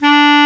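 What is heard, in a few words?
Bass Clar mf D1; Digital Sound Factory Virtuoso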